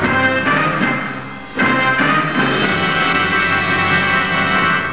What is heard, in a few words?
music